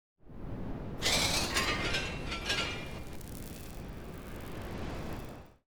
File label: crunch zones 3D